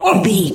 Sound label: voice male hurt pain